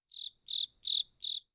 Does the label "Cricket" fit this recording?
Yes